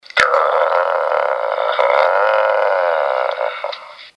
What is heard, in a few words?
Grrrrr